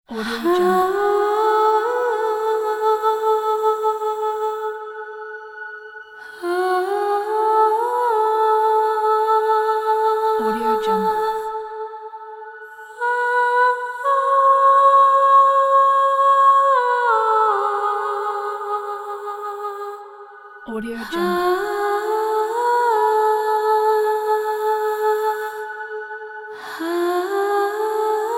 fragile ethereal fantasy meditation vocals

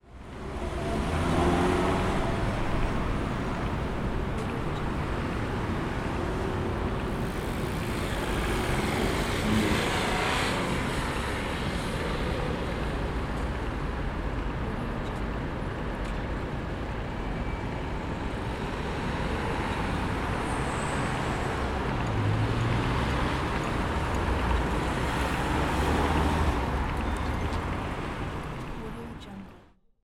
traffic ambience; cinemandre

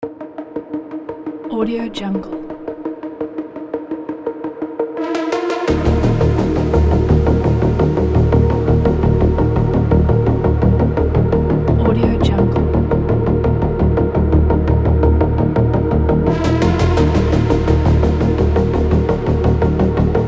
futuristic world

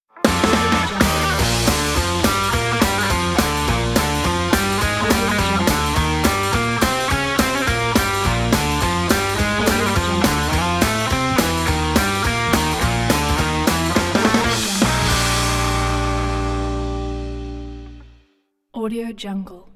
rock and roll logo

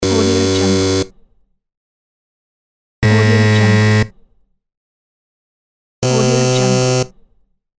game show buzzer fail

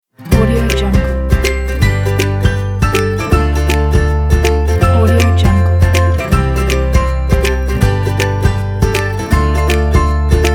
fun intro logo

